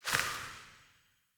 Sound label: Sparrow One Wing Flaps; Animals; Death State